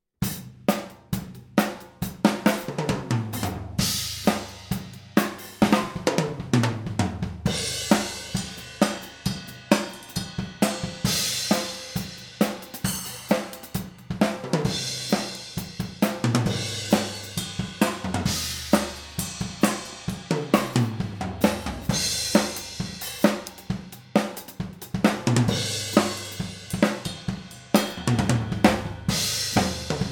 oh audix

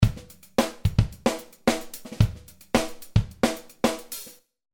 Ambience Drums

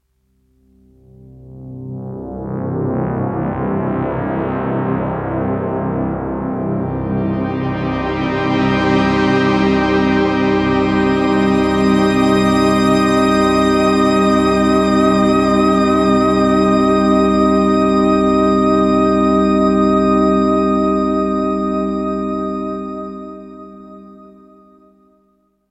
DX7IIFD FM Growth

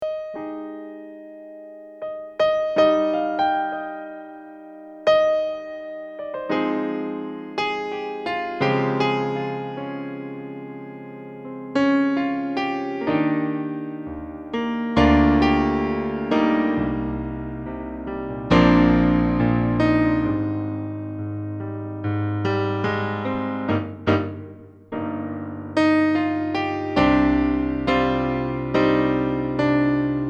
Kawai CN14 Concert Grand

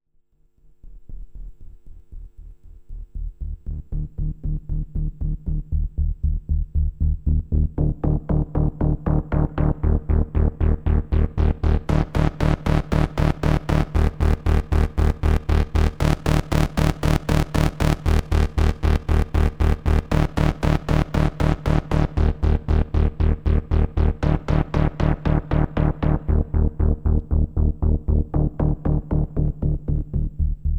Impulse Command Spring Reverb; DRP-20

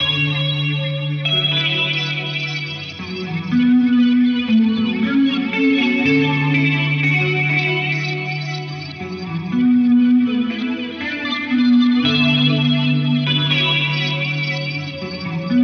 Delay Reverb Pedal; Minim

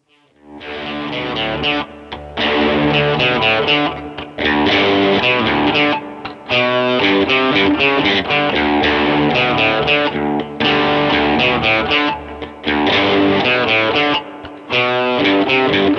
E-Guitar; Vermona VSR 3.2

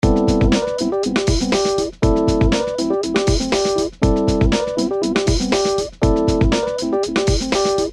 Rhodes

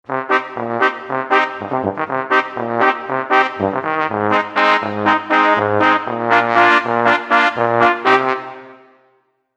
Roland AX Synth Wide Synth Brass

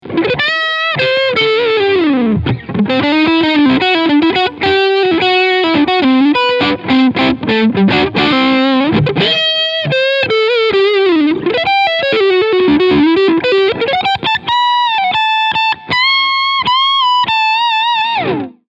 Leadsound Neck PU

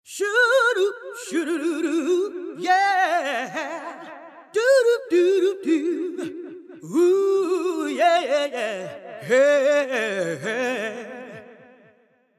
BOSS VE500 Ducking Delay

